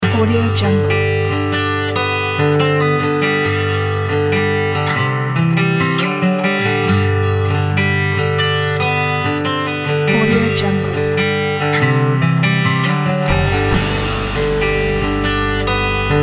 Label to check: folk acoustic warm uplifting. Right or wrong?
Right